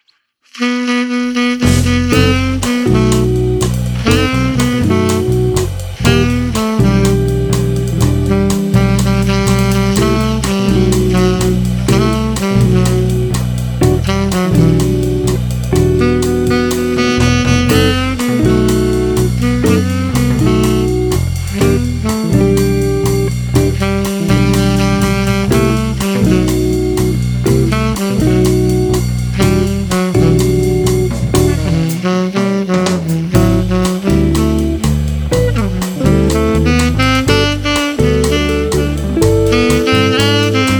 jazz band

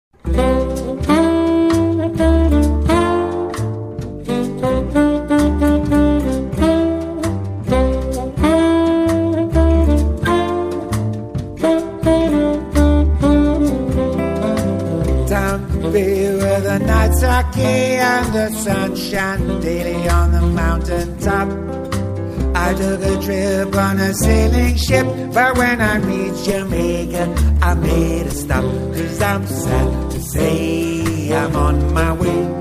trio

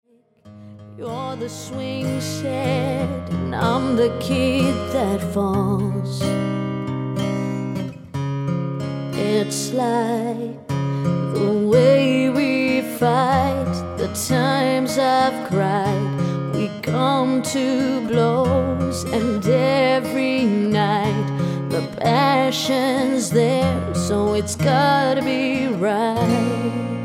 acoustic

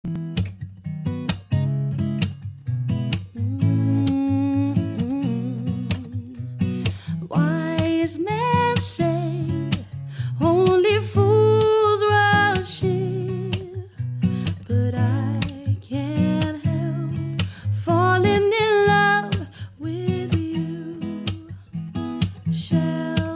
Acoustic Duo Cover

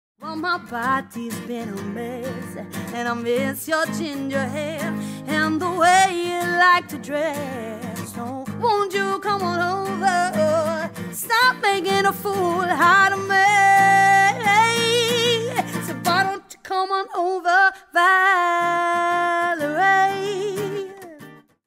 Acoustic Duo Cover

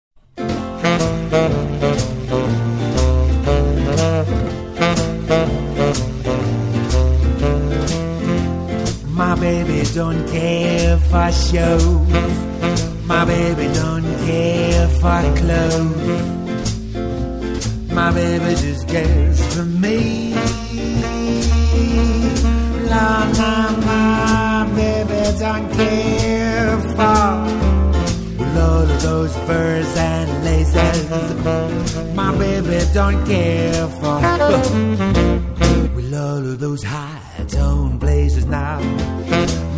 trio

